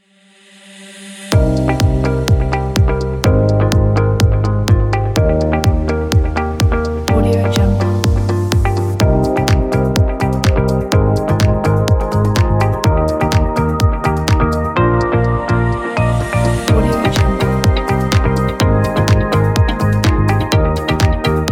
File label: upbeat inspirational motivational corporate